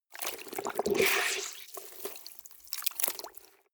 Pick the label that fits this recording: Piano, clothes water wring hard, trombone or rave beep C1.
clothes water wring hard